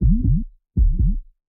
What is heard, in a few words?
Heart Pickup